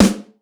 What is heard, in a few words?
rich lows snare g key